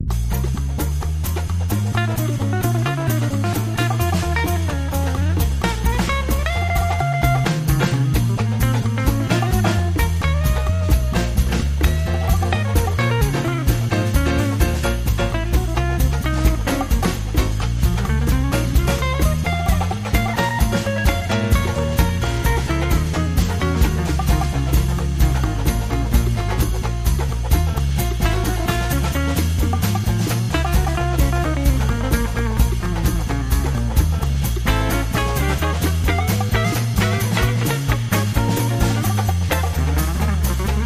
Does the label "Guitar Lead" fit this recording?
Yes